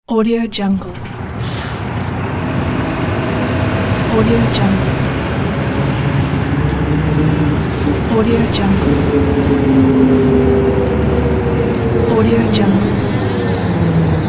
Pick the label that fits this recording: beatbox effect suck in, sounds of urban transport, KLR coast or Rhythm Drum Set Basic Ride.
sounds of urban transport